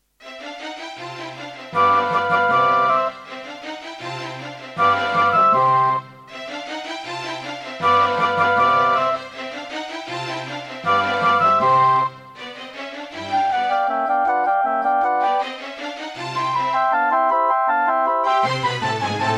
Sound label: Korg Audio Gallery AG-10; classical; Mozart; Concerto K467 partial